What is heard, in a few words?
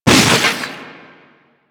Infested arm attack hit; Enemies; Warframe; InfestedNinetyNine